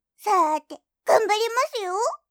Windows ログオン

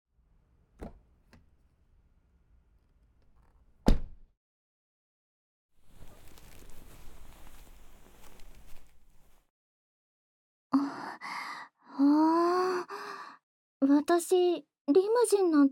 右耳ふーふー; 結婚したら、毎日こうやって癒やしてあげますね？

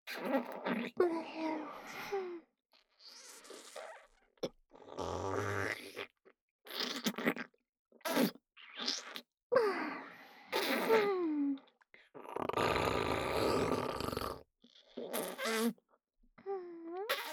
無垢 ねっとり口フェラ; フェラ素材

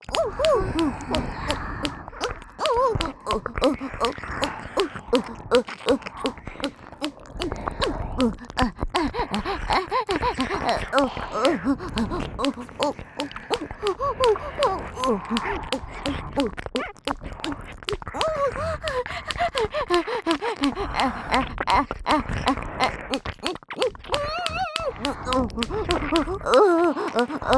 オホ 喘ぎ ＋ 両耳 舐め; おまけループ（オナサポ）; 3WAYループ; SE有

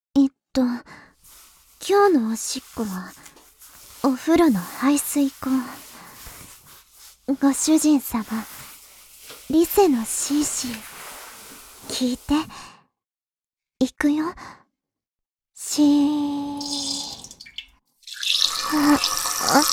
排水溝におしっこ

